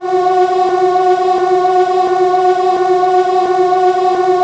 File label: SYN13, Retro Sampler; Best Service ProSamples vol.55; AKAI